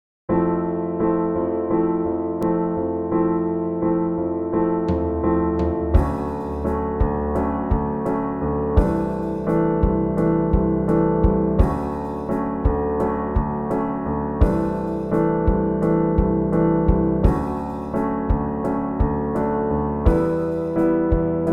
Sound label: piano thing rough drums